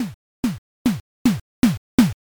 approaching steps

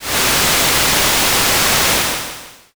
rain

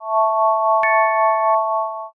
save point

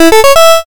start

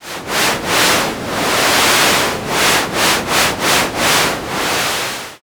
river

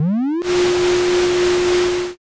vacuum

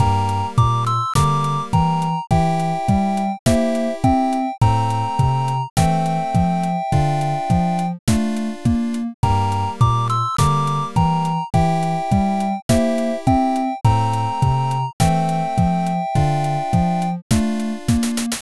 bgm

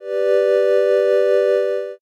strings

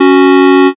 buzzer end

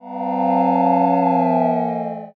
cheer far

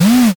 servo

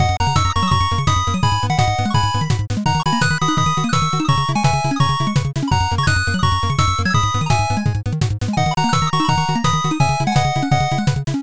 bgm drive

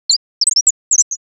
chirp mix

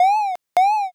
warning light

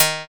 slap bass

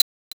stopwatch

